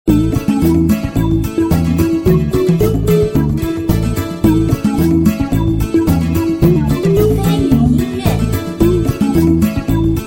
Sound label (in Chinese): 异域-欢乐-休闲